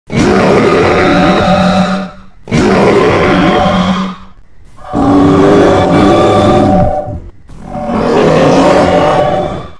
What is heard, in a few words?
owlbear